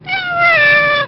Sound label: Cat